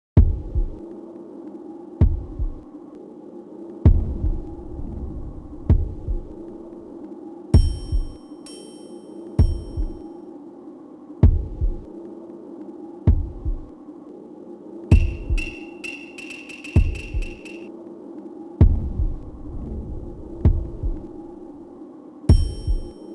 Meditation; Samurai Shodown IV

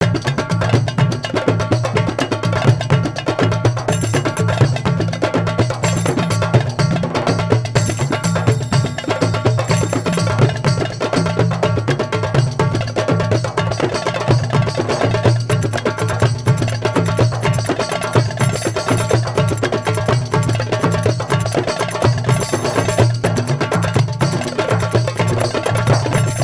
Percu Lp; SideCar; The Adventures of Tintin: The Game; The Secret of the Unicorn; Xbox 360

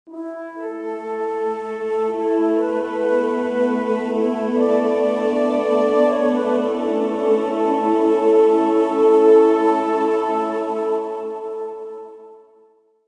Modern Calm; Civilization Revolution

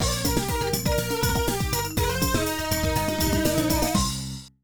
Quartet; Daytona USA arcade

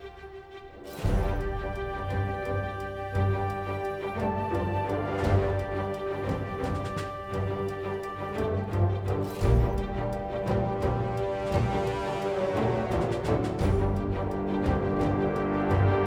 Default Loading Music

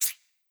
map events choices move

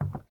Wood soft

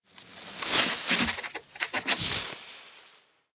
drawing

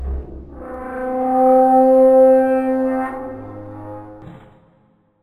Spook

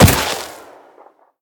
Gunshot Miss; The Town

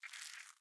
Egg Shake; The Inn

